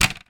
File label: leisure game rummy o plastic rack down on table; zapsplat